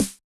Yamaha DJX 2B, SD T9 M

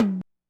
Tom, drumtraks

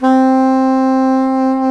SAX SOP SAX, Soprano Long

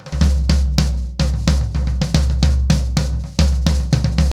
110BPM, SNARE TOM SOLOS

